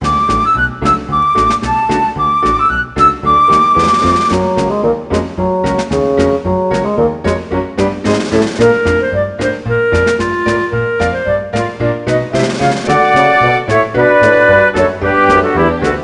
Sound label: Minecart Pushpull; Disney Princess: Enchanted Journey; Wii